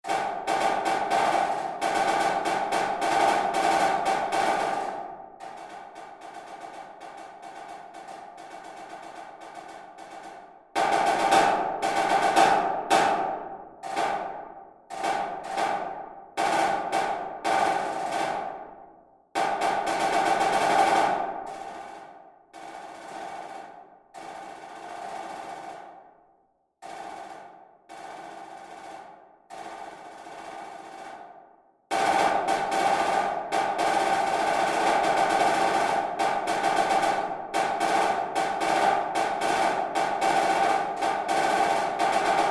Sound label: snare audition